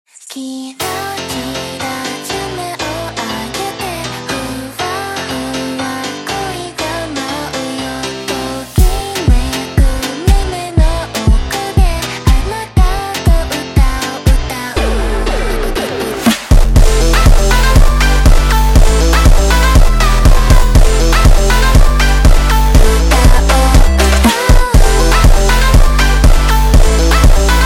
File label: Montagem slowed